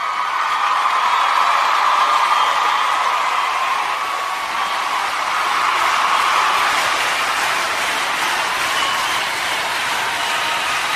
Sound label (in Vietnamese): Tieng reo ho co vu